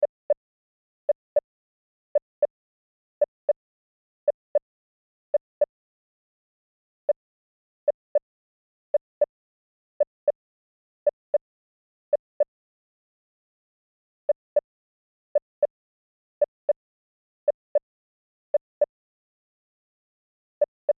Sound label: Science Fiction Control Room Beeps; Control Panels